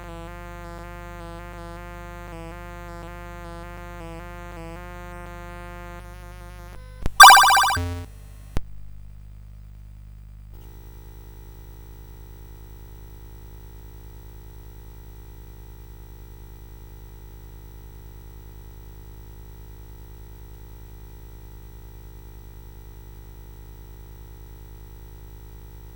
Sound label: Turn, CSG8580R5; Sound Effects